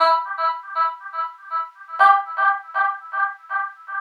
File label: Oh, deep house